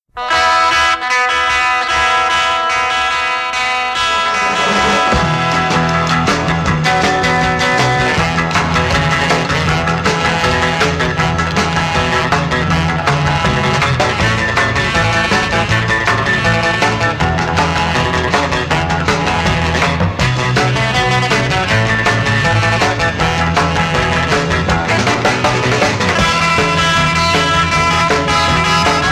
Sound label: Rock